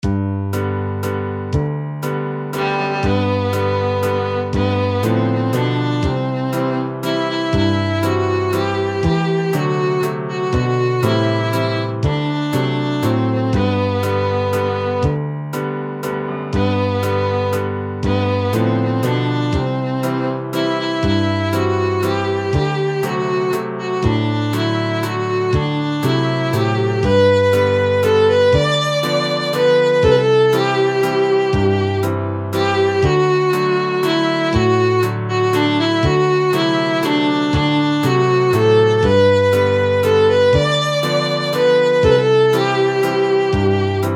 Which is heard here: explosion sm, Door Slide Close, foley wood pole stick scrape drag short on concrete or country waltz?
country waltz